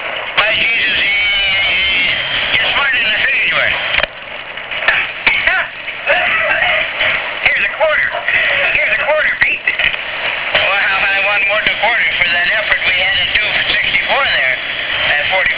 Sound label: singing